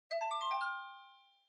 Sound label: Emergency Alert (Far)